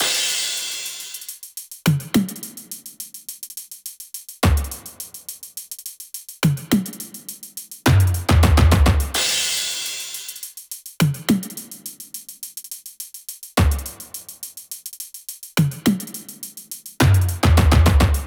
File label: perc 105; Drum Loops; Top Loops; SD MIDNIGHT Sample Pack; Slate